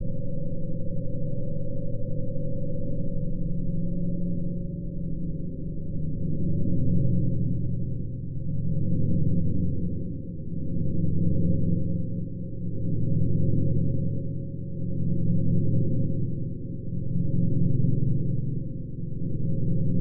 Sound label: electric gamelan ENGINE NOISE